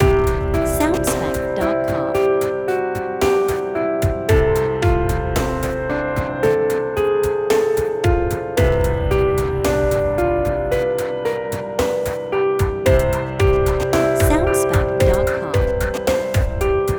Rainy Day Lounge Full Loop Track, Lounge royalty free music